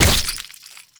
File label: Destructible Plant A Burst A; Warframe; Ambience; Grineer